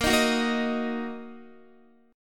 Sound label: Bb Suspended 4th Sharp 5th, Bb, down, Guitar; strummed; tuning 4E,4A,5D,5G,5C,6F